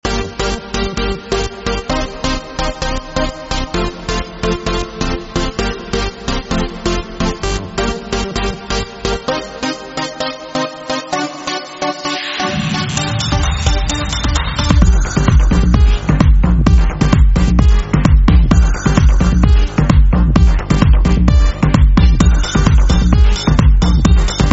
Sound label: Electro